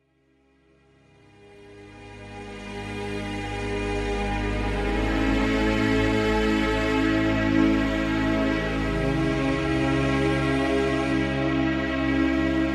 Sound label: spa music 432 hz